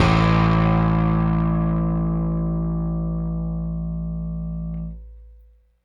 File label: Rickenbacker Bass; Airwindows